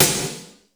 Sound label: BG SNR, METALIC DRM; Crypton - DrumBase5000; METALIC DRM2